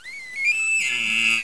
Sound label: pit drone idle; betapitdrone